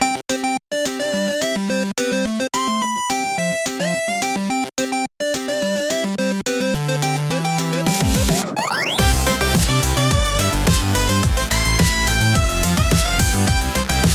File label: retro game chip tune